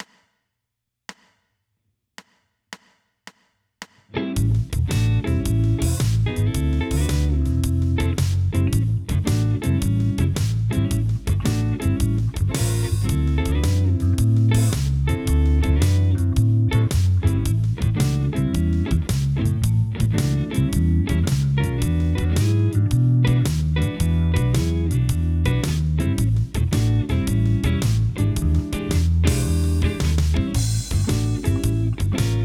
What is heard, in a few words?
Blues Solo Backing Track